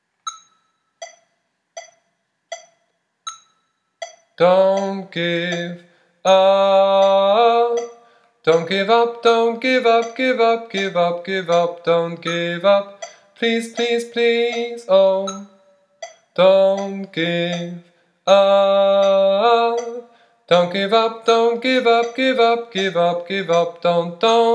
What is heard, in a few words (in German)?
Endteil Sopran